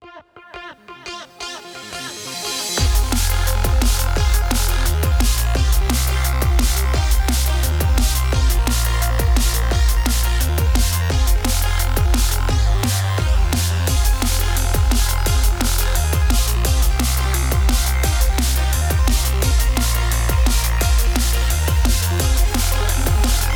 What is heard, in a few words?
Heavy Dnb; Cyber Hook